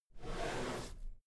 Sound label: drawer cabinet equipment open soft halfway